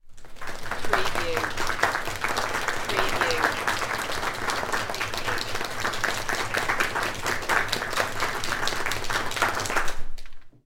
Small Crowd Clapping

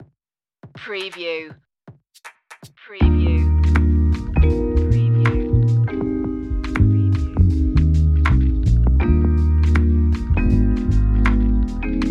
warm vibe jazzy chill

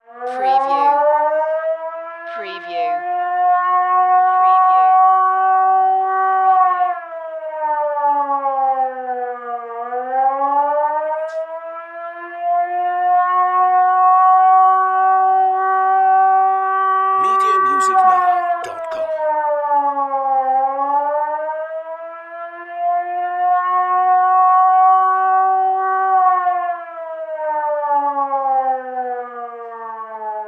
Air Raid Siren Small Type

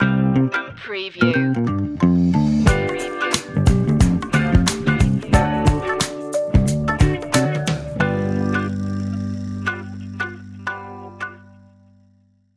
warm funky jam stinger

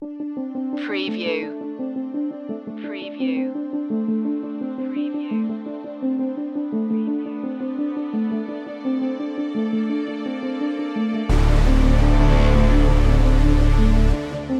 no drums or sound fx

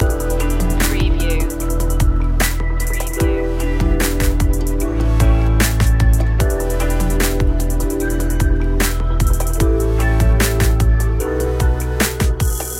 cool trap loop

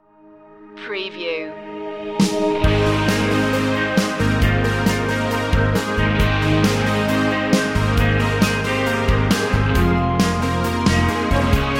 indie dreams

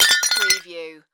Impact Tile Smash

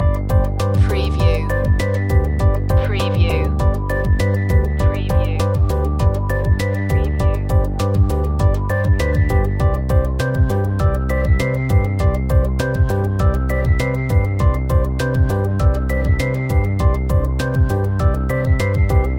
scientific discovery loop

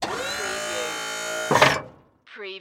Plow Lower Hydraulic

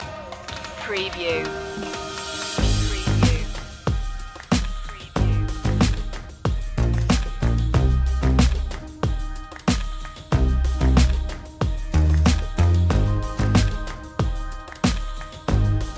bluestone streets

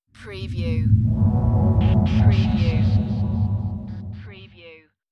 Scifi Audio Logo Ident,Deep Space,Pulsating Tone

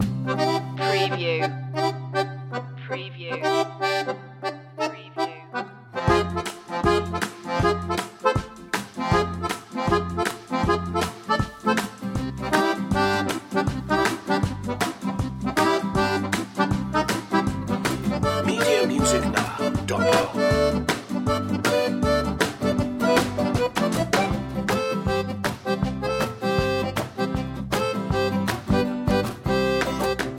zydeco loop

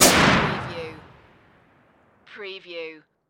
Flare Gun Shot

